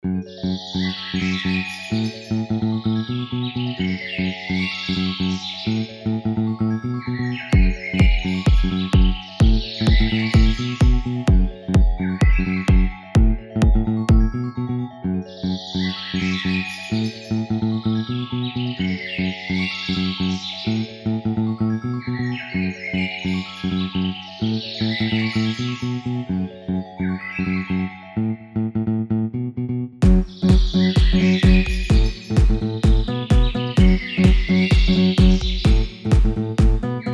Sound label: House Mix